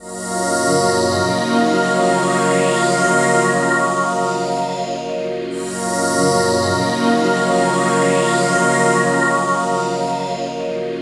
Pad, Melodic Synth

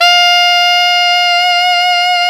SAX ALTO MF, Tube

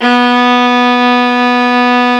STR VIOLA, Str Quartet, Strings

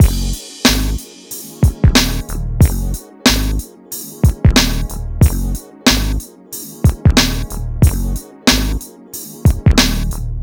MIX, Hip Hop and R&B Drumloops

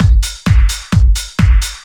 drum 130 techno; Techno Trance Essentials

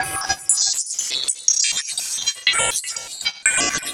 Squelchy; SFX; Patterns; Electronic Point Blank

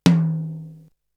Tom, Drums; ZERO G - Datafile DISC 3

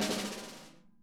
SNARE, Interactive Drum Kits

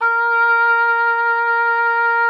A#4, OBOE; Xsample VOL-6

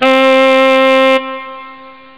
saxophone; ST-XX Amiga Soundtracker Sample Packs

